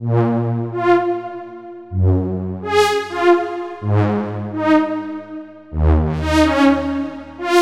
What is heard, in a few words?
Lead; Anjuna